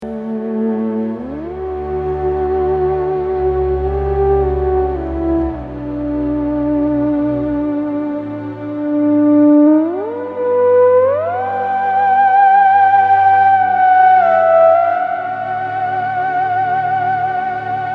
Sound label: Theremin